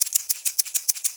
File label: MULTI EGGS; Best Service; World Colours